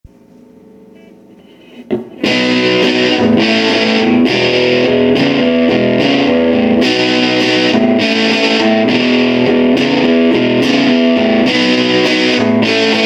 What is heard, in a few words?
Amps Dist